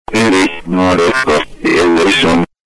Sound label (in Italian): che ritmo